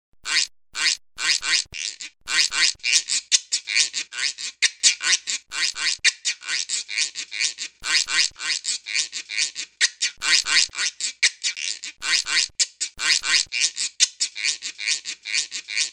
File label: guiro